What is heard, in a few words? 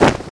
cloth; butterflysoup2